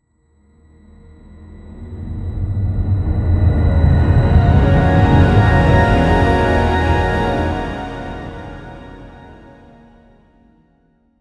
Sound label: slow tense and dramatic